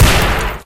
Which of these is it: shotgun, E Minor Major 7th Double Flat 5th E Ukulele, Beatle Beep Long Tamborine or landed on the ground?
shotgun